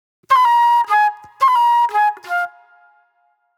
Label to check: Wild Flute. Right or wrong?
Right